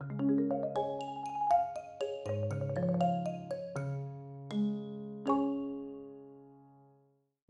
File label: cleared; chigau